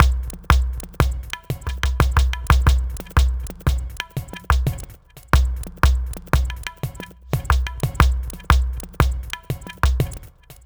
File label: Ethnotronic, Processed Percussion